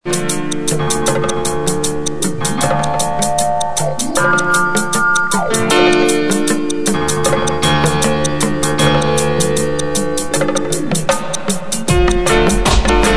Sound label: drums